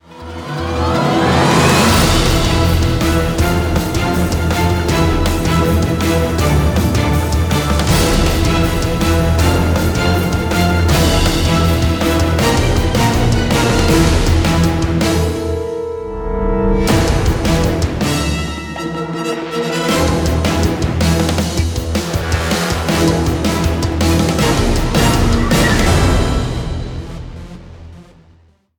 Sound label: Action Short; Emergency 5